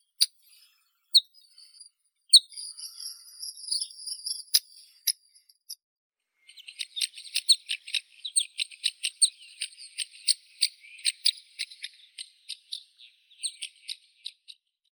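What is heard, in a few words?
black-necked wheatear, Oenanthe finschii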